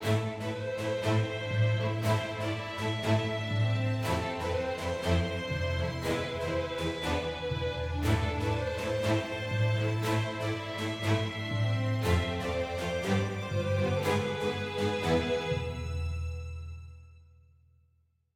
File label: heroic med